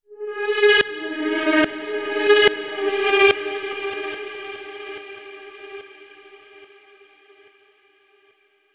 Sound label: Stinger Exploration Tones